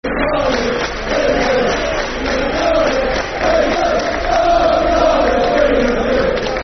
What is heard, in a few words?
Football Chants; Cardiff City; fanchants-com